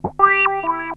Korg Prophecy hits, arps and fx; FM 57 - The Ultimate Sample Collection (1997)

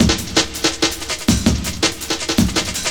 LOOP, Eurotech; Sounds Good

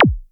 stomp bass, Drums, Roland SH-09

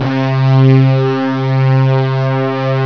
lead; jazz jackrabbit 2

